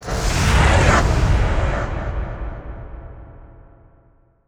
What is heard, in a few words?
item distiller ally; dota